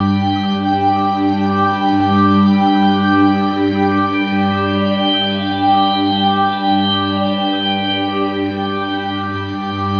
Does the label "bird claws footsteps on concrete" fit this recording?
No